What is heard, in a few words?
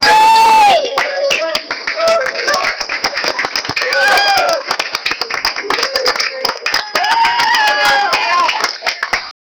club ambient noise of peo